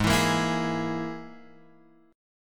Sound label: G sharp Suspended 2nd Sharp 5th, Guitar Drop D